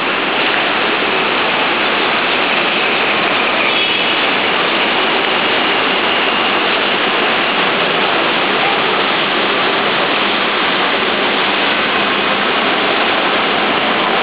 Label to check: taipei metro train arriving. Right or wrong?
Right